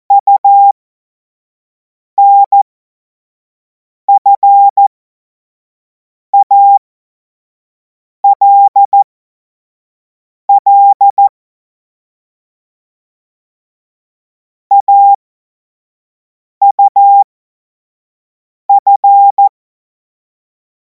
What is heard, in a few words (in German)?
Witz, Morsekurs, 25 F70; Tempo 25 F70; hamradio; cw witze